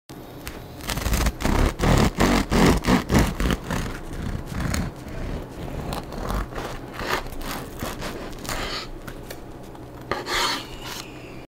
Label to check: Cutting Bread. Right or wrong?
Right